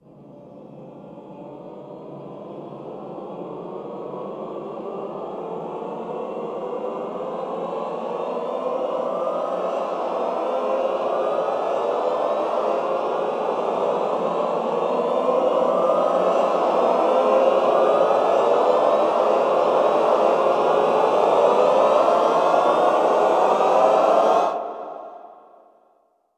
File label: ENDLESS, Classical Choir, ENDLESS GLIS; Best Service; Extended Classical Choir